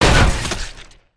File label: Spider Boss On Hit; creatures mechanical